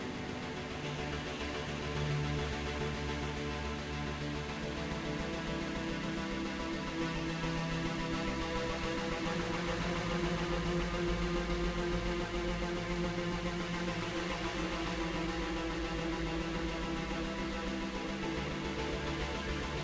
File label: Music Tension Battle